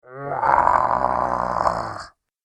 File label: Monster Snarling Roar; Andrew Fleming